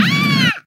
aaaa

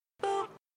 boop

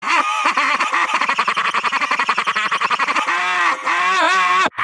laughing; wario

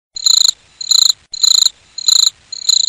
cricket

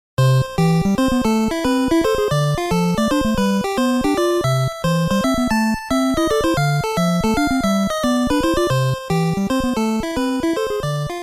bonus stage cassette version

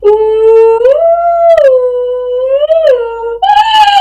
pigeon

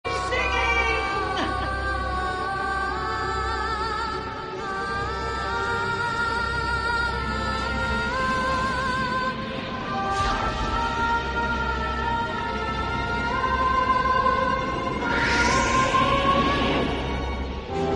vocalizing; ariel

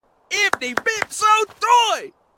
excitement; sims 3

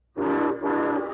black rhinoceros whistles

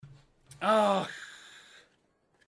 ahh

